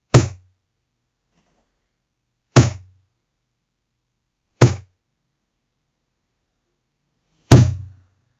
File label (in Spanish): sonido de boomerang